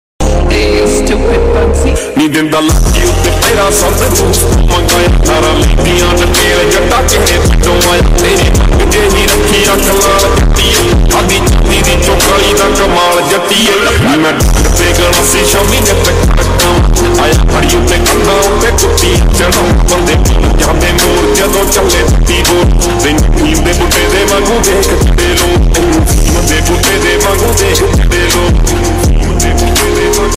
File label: lofi ringtone song ringtone attitude lofi ringtone